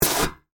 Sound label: Hydraulic Movement, Short, Burst, Scifi; Science, Fiction; Tech Sound Effects